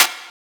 Snare, techno drum

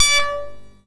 SID lead D; ChipShop Deluxe; ChipShop Hits

